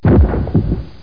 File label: explo